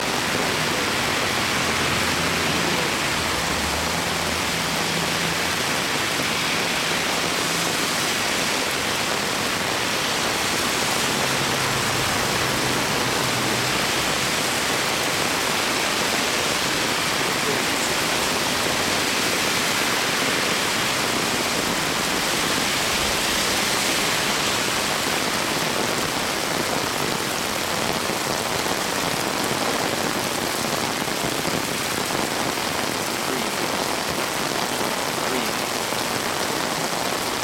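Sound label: ambience rain heavy wind Tokyo Japan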